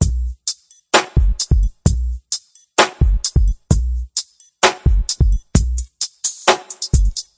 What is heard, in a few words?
smooth groover, drum loops and breaks